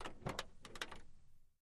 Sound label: Camaro Door Open Ligh, chevy camaro